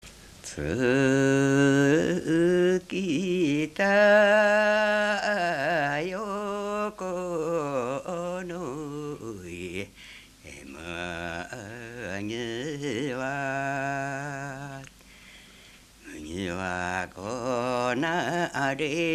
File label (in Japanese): mugitukiuta, minyou